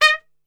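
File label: D#3 piccolo trumpet short, Brass; Best Service; Brass Super Section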